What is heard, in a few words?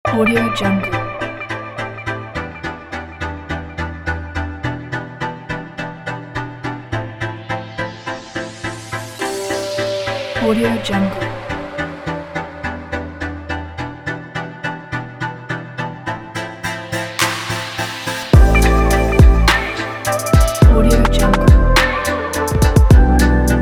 street groove